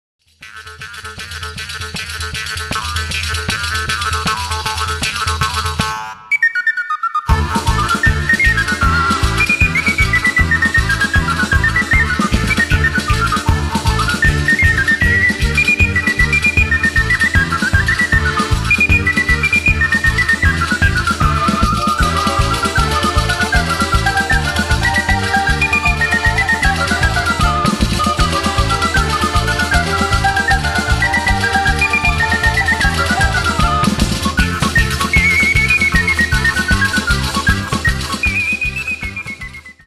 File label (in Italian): Tarantella